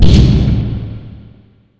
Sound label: door stop; svencoop